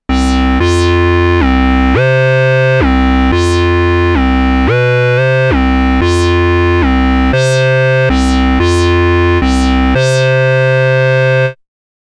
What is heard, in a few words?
bass aggro filter lead; Volca Bass